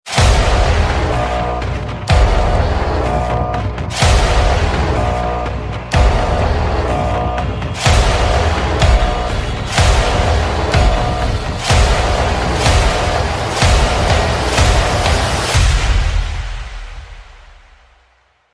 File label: HORROR END